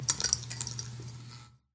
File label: light flicker, ambient lights